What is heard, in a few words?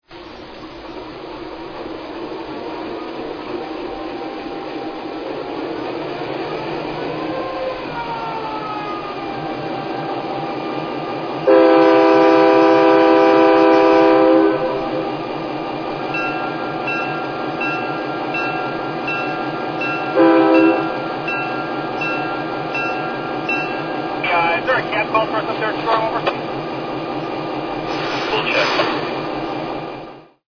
GP7, trains